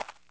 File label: walk, dirt foot; The Elder Scrolls III Morrowind GOTY; XBOX